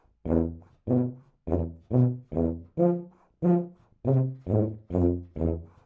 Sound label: tubas